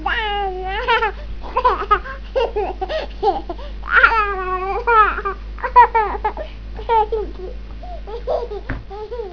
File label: laugh